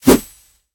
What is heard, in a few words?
swing; Smite; god Kuzenbo v2